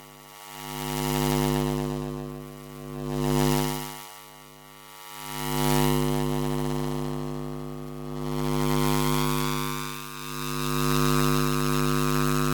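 Electric, Pass-by, distortion, motor, turning machine, accelerate, increase, decrease; Electric Field; Sound Sower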